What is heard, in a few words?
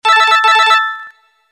Sms Tunes